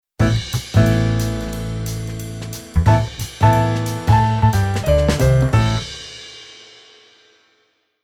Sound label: Keyboard Pf Jazz